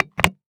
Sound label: Foley Footstep High Heels Patio Stone Single Step; Foley Footsteps